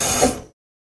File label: Water Fountain Off